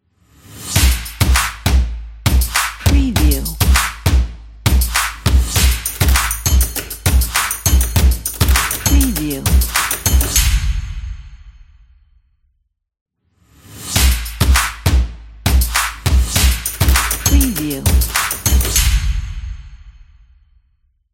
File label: action stomp percussion logo